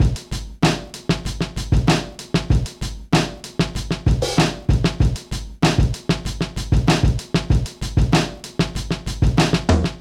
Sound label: Rusty, Drum Loops Vintage; Zero-G Smoke